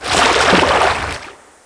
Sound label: swim top; thief